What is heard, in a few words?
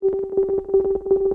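GHOST peace idle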